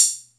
Atlantex Music Percussion Computer HH closed, drum machine